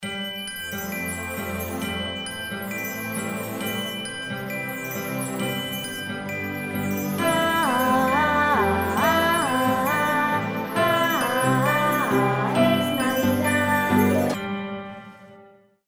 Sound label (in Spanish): cancion navidad cantante infantil singer; Canción navideña; Música compuesta por ella